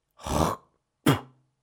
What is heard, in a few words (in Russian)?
plevok